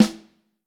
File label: Vintage Snare Drum; Q Up Arts; Johnny.C's Vintage Snare Drum; 7.5X14